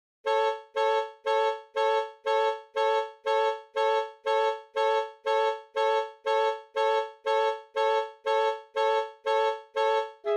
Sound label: Saxophone alto